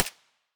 mp5 silencer mono distant

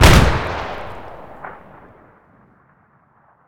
grenade m209 boom; STALKER; IWP

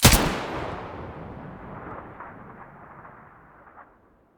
aug muzzle mono, weapons; STALKER; IWP

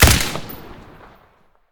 ak103 silencer stereo, weapons; STALKER; IWP

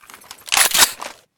mdr misfire, weapons

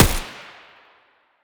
sa58 muzzle mono distant

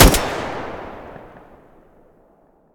groza muzzle stereo, weapons

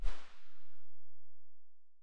sa58 silencer very far, weapons; STALKER; IWP

